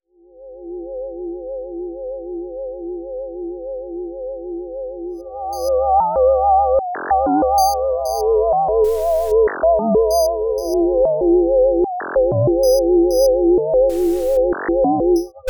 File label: Sine Pad; Sequential